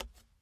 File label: step Bare, footstep